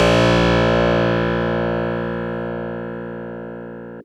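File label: Fuzz Clav DX100 A#1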